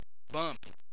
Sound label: bump